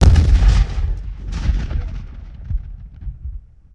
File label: Low End Boom Sweetener; Impacts & Destruction Sweeteners; Sony Pictures Sound Effects Series Volumes 1-10; Sony Creative Software